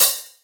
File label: 00s Natural Open Hi Hat Sample D Key, Royality free open hat one shot tuned to the D